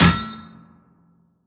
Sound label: Metal Impact Heavy